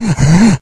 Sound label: become alert, infected